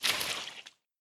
deepwater footstep; adams venture origins

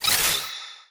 Magic; portal quest android; Antihero Skill2

